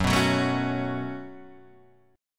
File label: Db 7th Suspended 4th Sharp 5th Gb, guitar